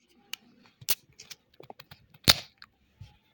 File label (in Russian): zvuk otkryvaniia banki can opening sound